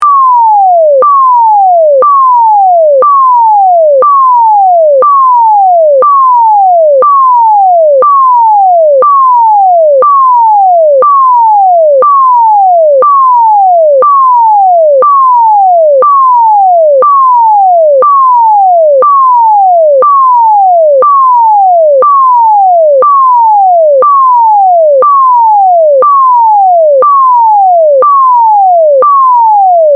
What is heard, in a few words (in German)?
Fluchtalarm; NTI